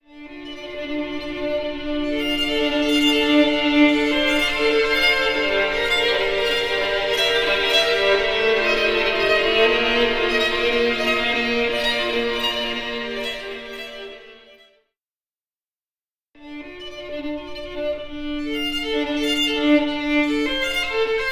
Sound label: Violin Marble Atrium Binaural; Blackhole Immersive